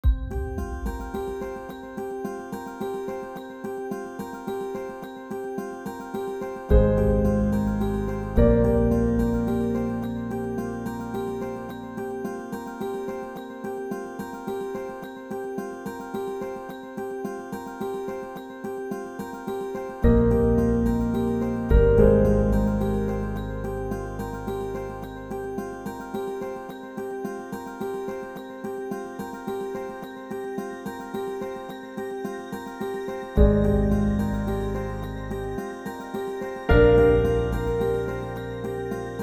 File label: reflective medium